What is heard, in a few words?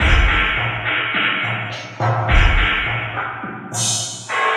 Slow Beat, Electronic Beats; Sonic Foundry (Sony Creative Software); Myths of Technology